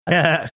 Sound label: cute chuckle